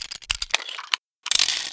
revolver reload

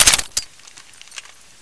smg reload

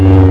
saber swing; lightsaber

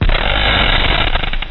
momma attack

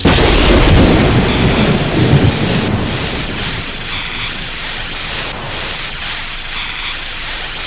force lightning; lightsaber

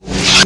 saber off fast; lightsaber

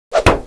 flashlight swing hit; weapons; flashlight zm

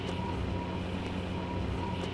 darksaber loop; lightsaber

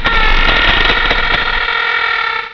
momma pain

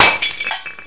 molotov break; weapons

